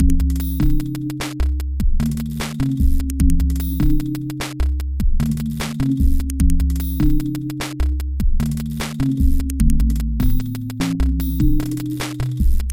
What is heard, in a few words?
clockwork cicadas; weeklybeats-2014